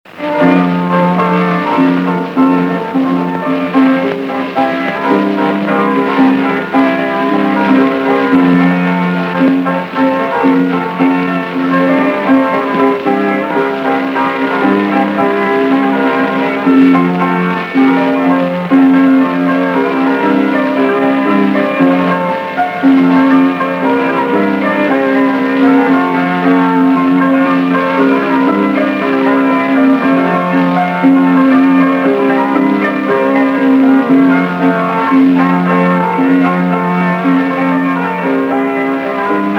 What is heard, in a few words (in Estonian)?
Polka Tartu Maarja kannel folk; Litsu paale; Joosep Kotkas